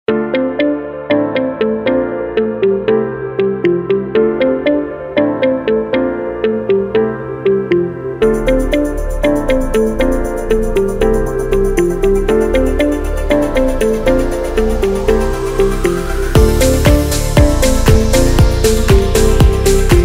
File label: Commercial Music Advertisement music